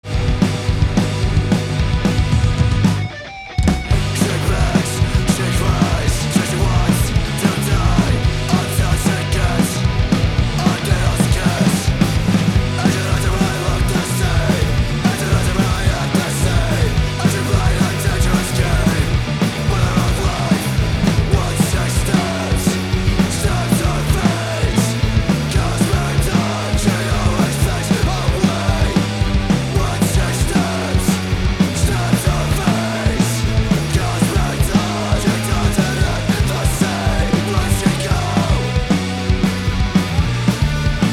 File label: Hardcore Echo Spring BEFORE